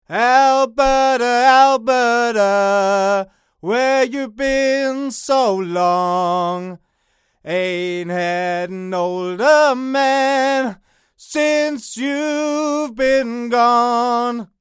Brauner Phantera S1 far

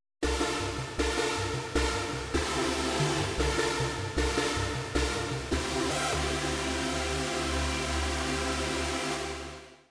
Roland Fantom XR Synth

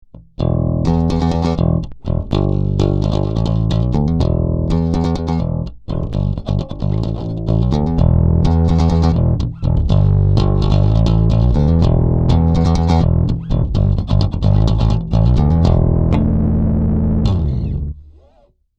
Active Bass Plec; Glowplug

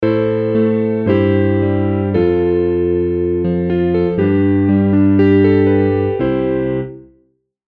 Piano 70s

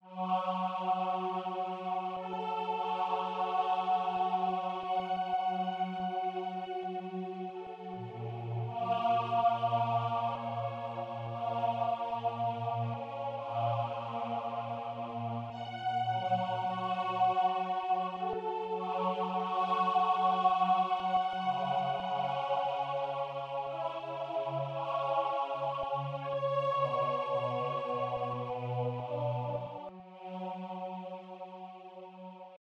Vox Vocis

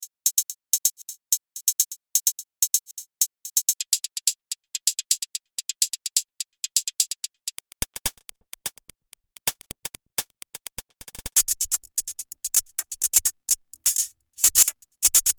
HiHat Loops

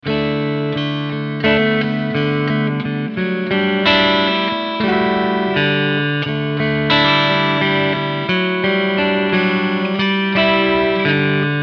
Clean Picking Front HB

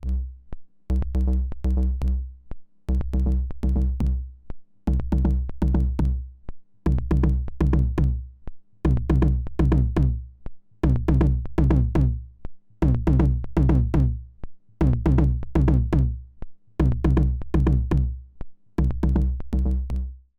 spring filter DRIVE; Snazzy FX; the eternal spring filter

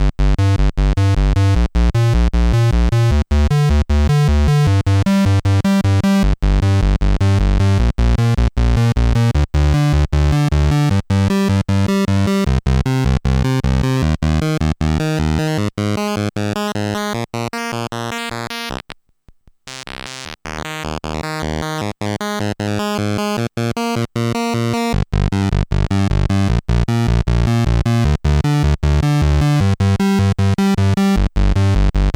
Pulsewidth Manually Changed, synthesis; Groove Synthesis 3rd

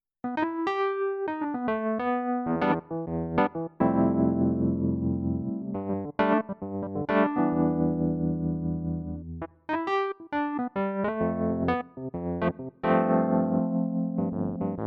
E Piano

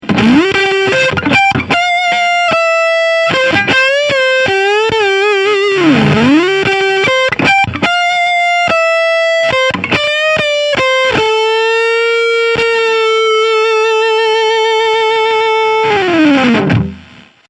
Tweak Fuzz

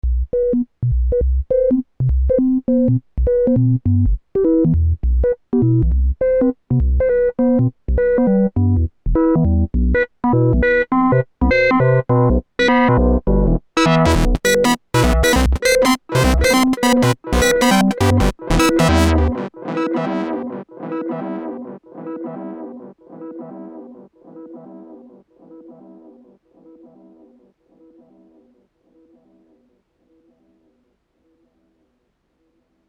Echo Fix EF X3 Bass Station 2 Sound on Sound

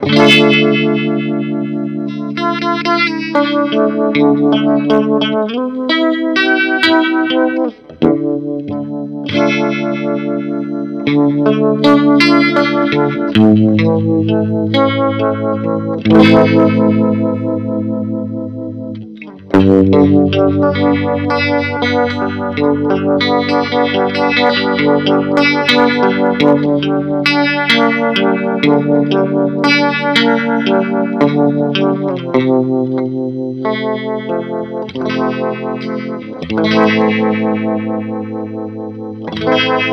Dunlop Authentic Univibe

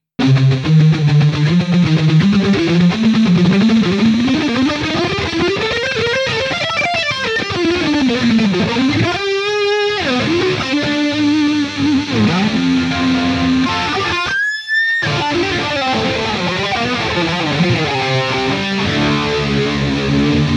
insane shred

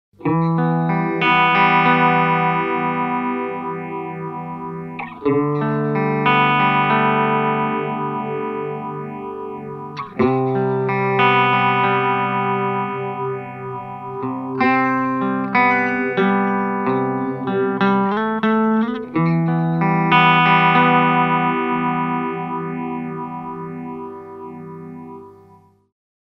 Eventide H90 Sound Instant Phaser

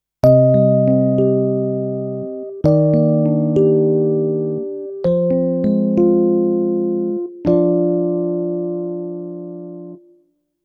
Yamaha Sonogenic SHS 500 E Piano

